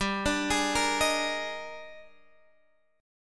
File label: Eb Major 11th G